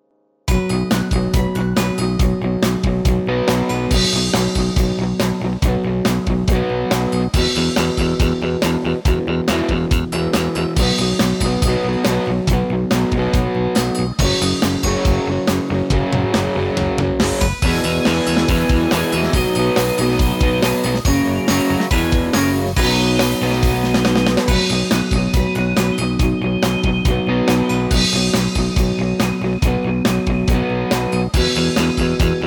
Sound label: karaoke; my room